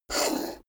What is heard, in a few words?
poker chip flick slide table long